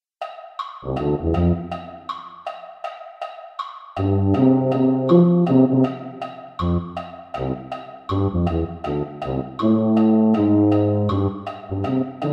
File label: tuba; holiday brass